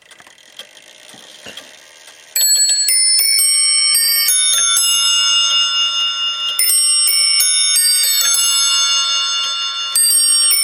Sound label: english 8 bell, clocks